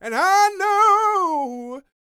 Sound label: Vocal Gospel; Vocal Planet Vol1 Gospel